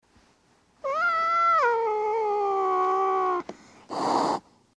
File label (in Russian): preduprezhdaet chtoby ostavili v pokoe